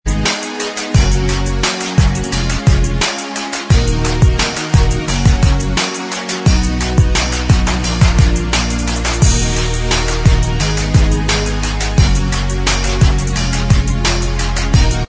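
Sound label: instrumental, backing track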